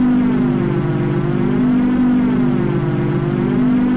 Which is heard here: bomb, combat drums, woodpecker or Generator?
Generator